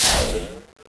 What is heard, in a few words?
leg release, implants; Dystopia